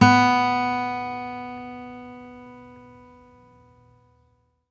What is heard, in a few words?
HRD PICK; Quantum Leap; Guitar & Bass